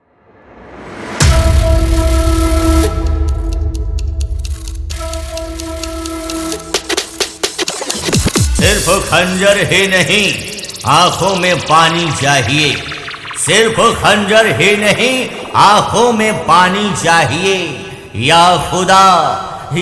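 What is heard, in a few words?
Intro Dialogue Dj Remix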